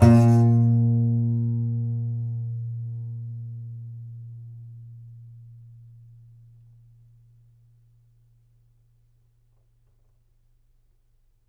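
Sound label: Contrabass Balalaika, Plucked Strings; E-MU Producer Series Vol. 7 – Old World Instruments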